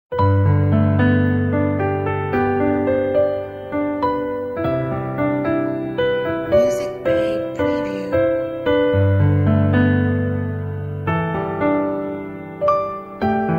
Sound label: Piano Logo